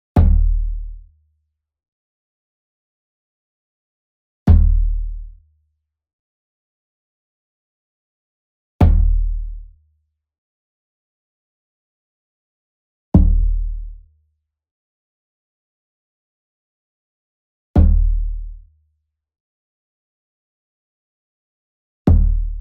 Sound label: SMALL DRUM Fontonfrom Hit Soft Beater Near; boom library; Cinematic Strikes Construction Kit